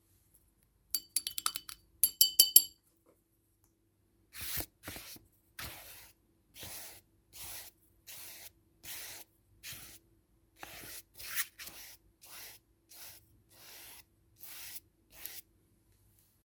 ploskaja kist dlja akvareli; Звуки кисти художника или маляра; звук рисования

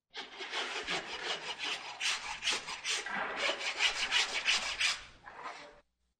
podpilivaut kopyto loshadi

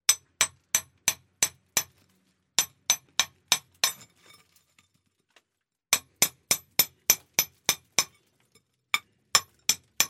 molotkom obbivajut kirpichi

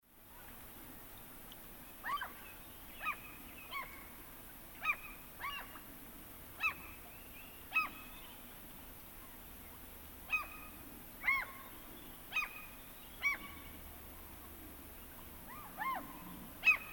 eshhe odna chajka podletaet i udaljaetsja

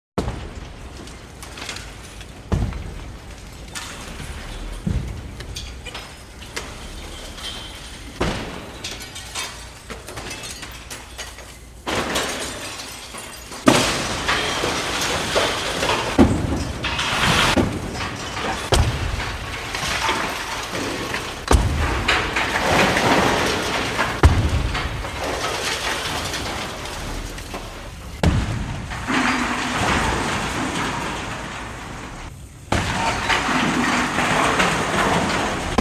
tarannyj shar privjazannyj zheleznoj cepju k kranu stuchit po torcevoj storone doma; Звуки строительных работ; снос зданий; Звуки строительных работ обычно связаны с разрушениями, такими как снос зданий

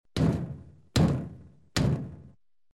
tri udara kulakom po vhodnoj; Звук стука в дверь

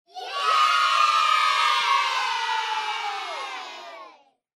eshhe eee

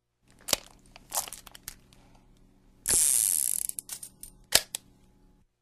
Pistolet razryazhajut

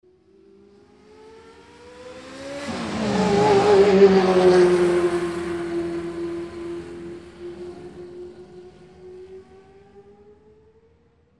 proezd krupno

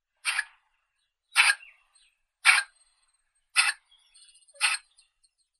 shipenija

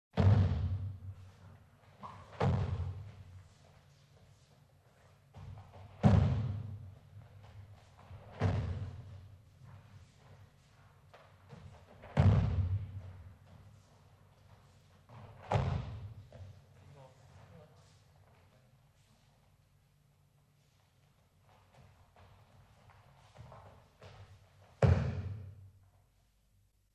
padenia

